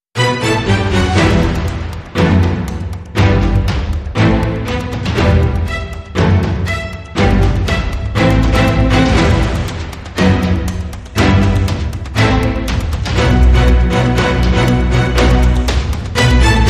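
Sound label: Hybrid Sting, Orchestra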